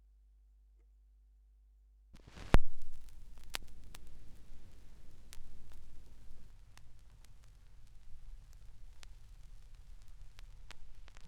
schallplattengeraeusche; rauschpartikel

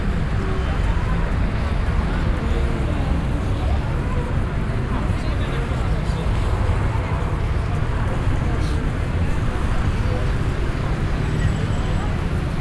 an einer ecke in sp, Brasilien; Fieldrecordings

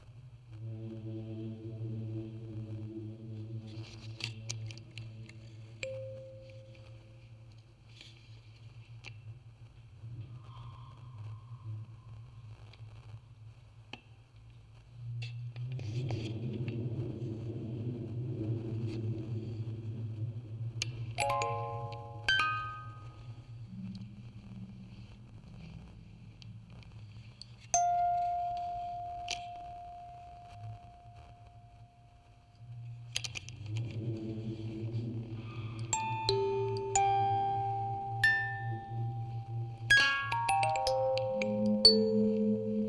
Aufnahme Spieluhren Quartett 8 Kanal stereo; Tonband, Vocoder und Fieldrecording

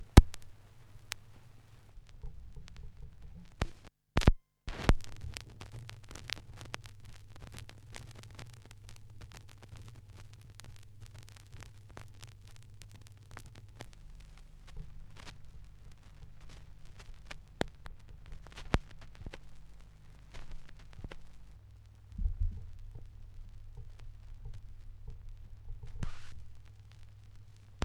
schallplattengeraeusche, Mix

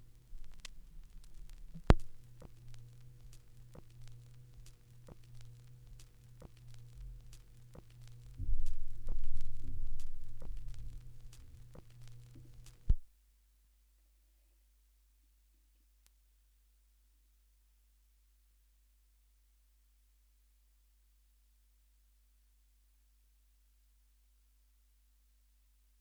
schallplattengeraeusche

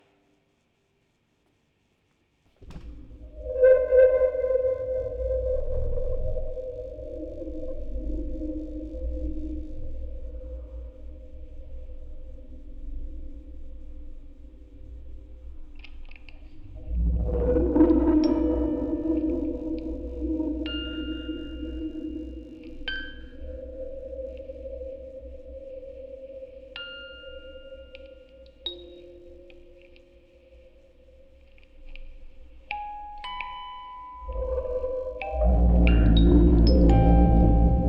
Aufnahme Rode NT4 Tape out Vocoder, Spieluhren-Quartett; Tonband; Fieldrecording

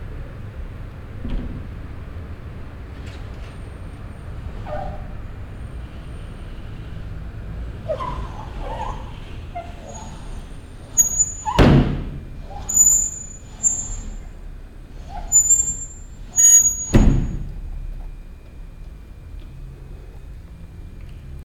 aus dem fenster quitschen; Fieldrecordings

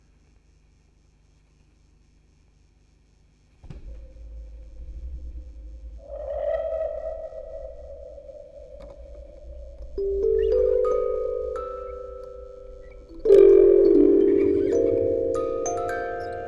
Aufnahme 14 Kanal stereo, Spieluhren-Quartett mit Tonband, Vocoder und Fieldrecording

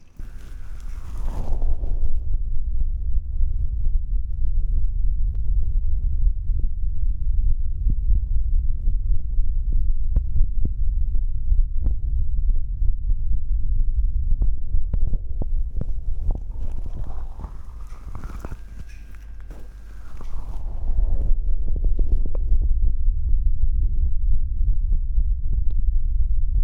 Ohren Zuhalten; Fieldrecordings; Cafe in Lissabon

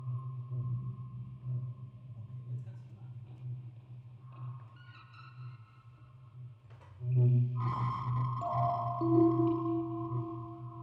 Aufnahme Rode NT4, Spieluhren-Quartett mit Tonband, Vocoder und Fieldrecording

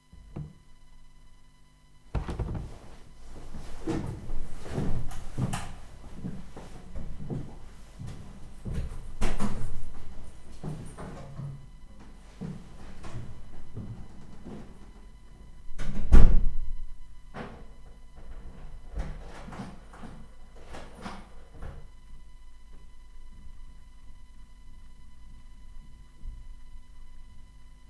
kuehlgetraet zuhause vorspiel; kuehlgeraet zuhause; Fieldrecordings

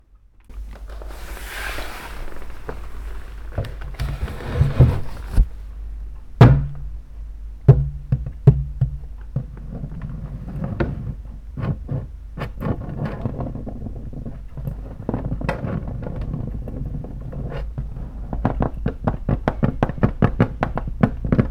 O-Ton (Studio Improvisation)

